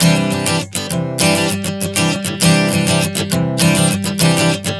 Strum 100 C, One Chord Strumming 100 bpm

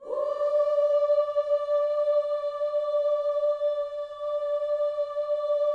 WHOO D 5B, Vocal Planet Gospel; WHOOS; ILIO

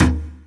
perc, Percussion; Trance Explosion Vol1

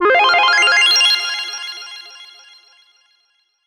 Glitter F min; Glitter57

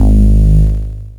BASS E4, SP1200; E-MU Producer Series Vol. 8 – Platinum Phatt

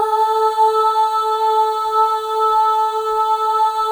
AH, FEM; Spectrasonics; FEM.2 AHS

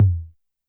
Tom Ana Clean, YAMAHA RM1X

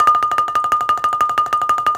BALIFON, Pitched Percussn; E-MU Producer Series Vol. 7 – Old World Instruments

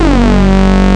SYNCHRO; East West - Global Sounds; THE CAT

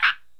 PERC KALIMBA

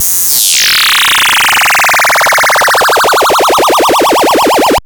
Wobble FX; Graz

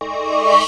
TECH KIT, RAVE; Rubber Chicken Software Sample Bank

